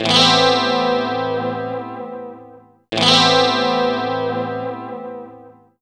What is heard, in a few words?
Jazz Tip 165 E, Guitars; Total Drum&Bass